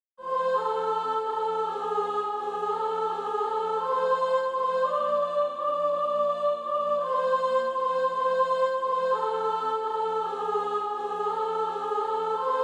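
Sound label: soprano, SATB Practise Music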